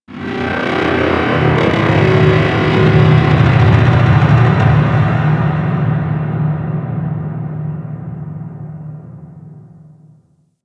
Ocean Underwater Metal Creaks; Sentient; Warframe; Grineer Ocean

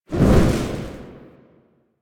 Sticky Bomb Proj, Weapons; Grineer; Veilbreaker; Vor

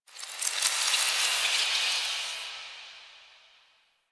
Metal Whispers; Warframe; Quests; TheNewWar; Ambience; Zariman

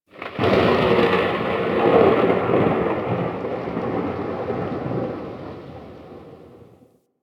Exterior Thunder Claps; Props; Warframe; Railjack

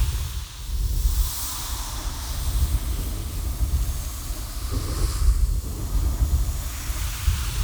Invis Rune Active Loop; Powers; Runes

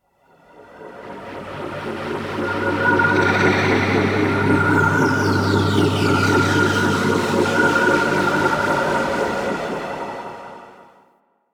Exterior Cloud Probe, Ambience; Warframe; Rail Jack

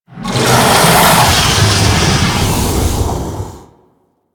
Forest Water Pump Retract; Ambience; Props; Warframe; Grineer Forest

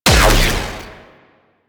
Air Pistol Projectile Explosion; Warframe; Corpus

